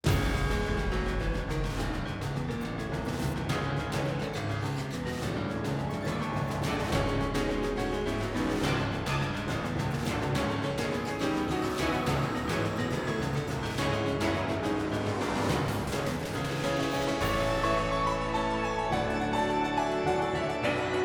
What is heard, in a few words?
Event Suspense Heavy